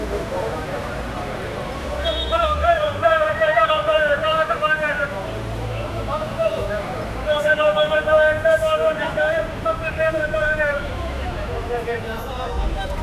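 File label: paris street ambient noise, bustle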